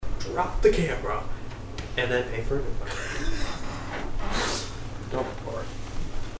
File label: Dumb Fart